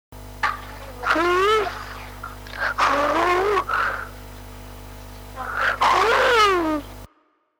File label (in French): loup